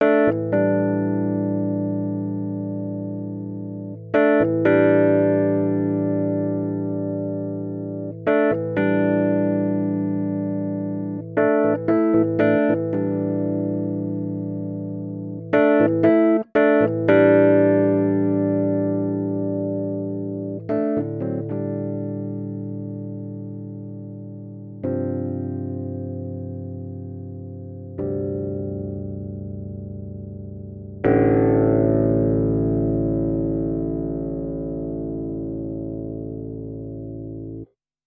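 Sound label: RND Portico 535 70s Suitcase Comp OFF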